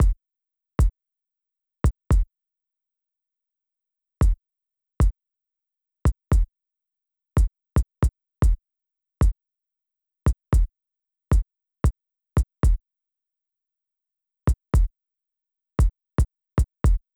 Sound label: Kick Sample Solo